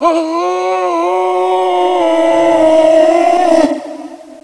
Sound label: priest Sacrifice; NETSTORM